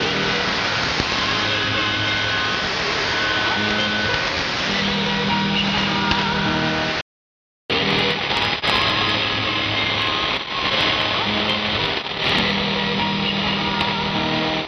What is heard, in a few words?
3995, DX DSP, weak